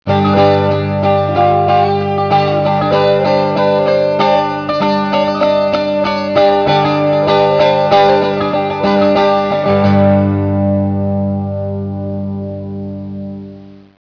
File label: cittern